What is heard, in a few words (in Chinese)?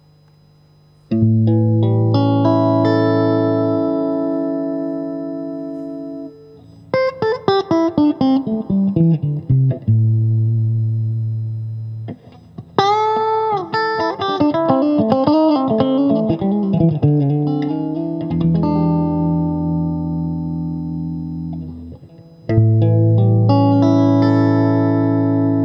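60S MWS 美产铜线 清音 3档, acoustic guitar; kindman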